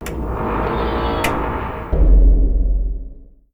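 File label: Door Close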